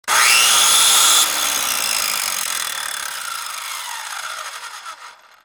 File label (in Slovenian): EFEKT CIRKULARKA